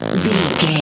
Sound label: foley leather stretch couch chair